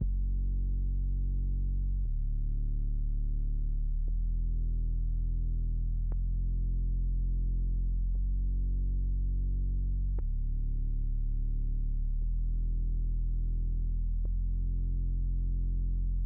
118 bpm F m Bass Melodic

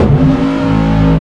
A- Bass Hit

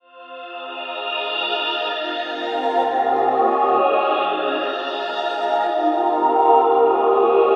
MELODY LOOP SYNTH PAD CM 127 BPM AMBIENT RNB

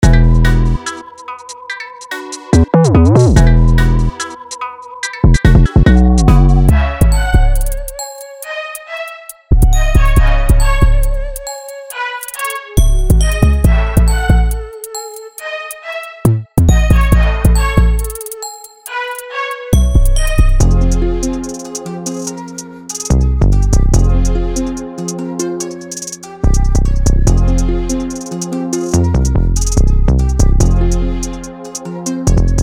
Drippy Drill Melodies

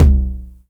Low Tom

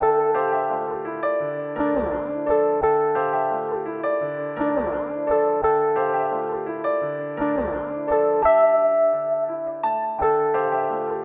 171 Bpm

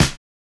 Snare Shot

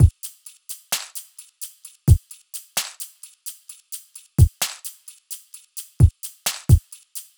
130 Drums Full

